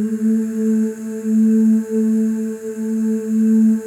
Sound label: OO, FEM OOS, Voices; Symphony of Voices; Spectrasonics; FEM.2 OOS